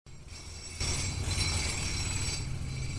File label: builders drilling sound